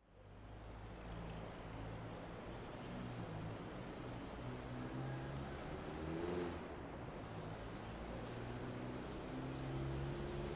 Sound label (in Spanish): CARRO PASA POR CAMINO RURAL AYACUCHO